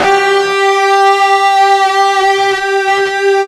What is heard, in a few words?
FUZZ G 3, Guitar; East West; Steve Stevens Guitar Samples Collection